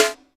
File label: Snare, Alesis DM5